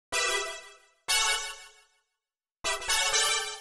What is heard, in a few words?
125 Soft pad A, Keys, Nu Jazz House; PowerFX - Nu Jazz House